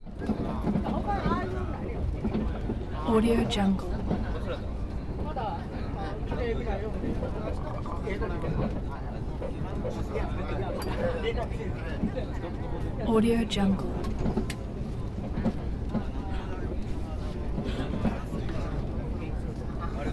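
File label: passenger train interior constant semibusy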